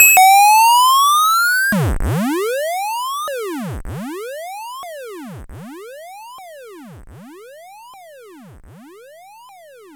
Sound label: Perc, Nord Modular Drum Samples; Yawgmoth